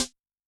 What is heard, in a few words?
Ana Hit, Yamaha DTXpress-II snare